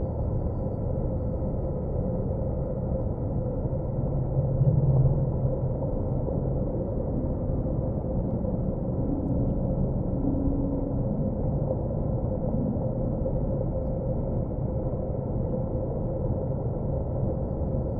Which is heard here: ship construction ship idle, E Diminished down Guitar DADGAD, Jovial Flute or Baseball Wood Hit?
ship construction ship idle